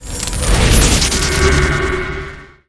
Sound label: Firepole